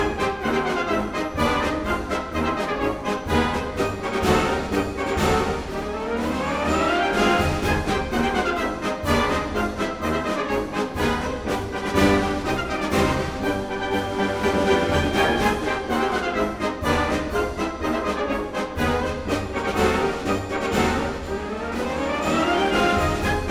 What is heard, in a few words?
clown music